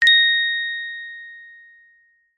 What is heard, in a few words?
multimedia notification bell chime ping tone high pitched